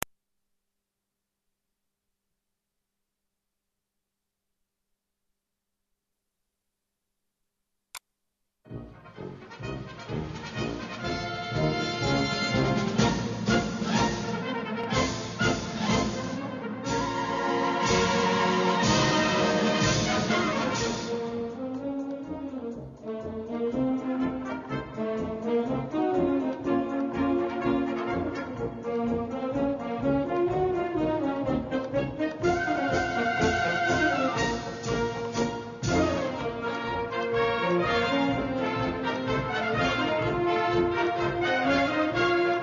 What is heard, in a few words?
ukrainian military band